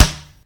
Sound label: Flesh Rubber, Collision Sounds; opensim; CollisionSoundsAssetSet